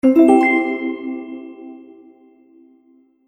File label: Magic Purchase Ding; Cartoon Sound Design